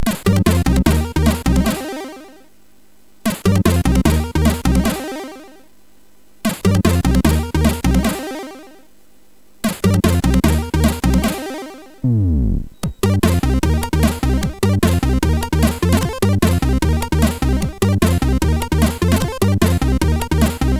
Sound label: Experimental Bass, sid; Longhair